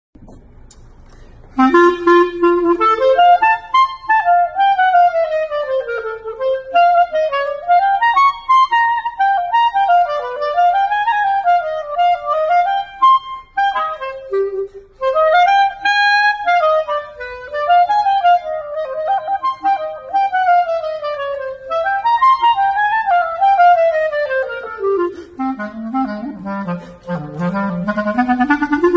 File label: Clarinet; ساز قره نی